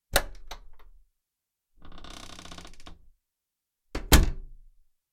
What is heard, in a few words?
Front Door Open Creak Close; noise creations